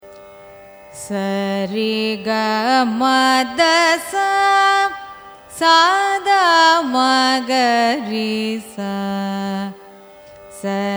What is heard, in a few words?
raga abhogi arohanam avarohanam; carnatic music